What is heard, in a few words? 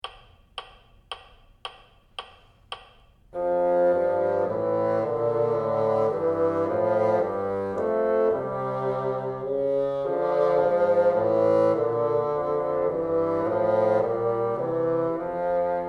together, bassoon